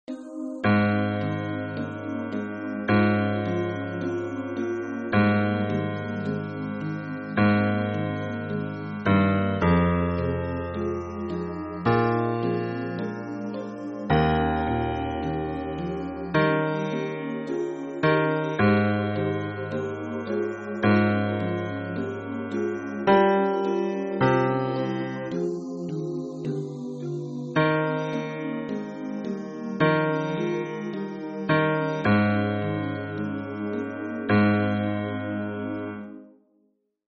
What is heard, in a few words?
hymn bass